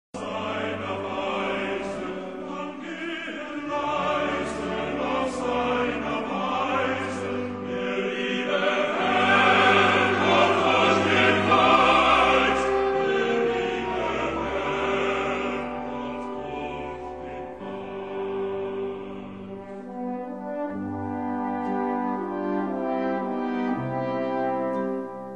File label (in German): Chor Waldandacht